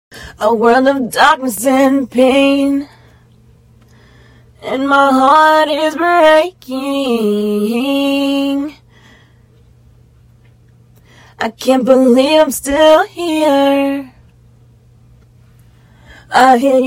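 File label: pop, 130, B flat minor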